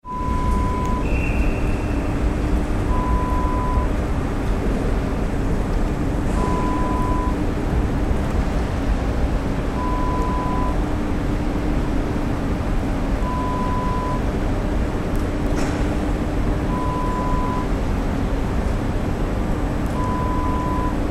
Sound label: Station ambience sound